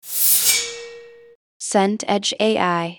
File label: whoosh metallic